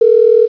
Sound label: ring; LTspiceIV; Educational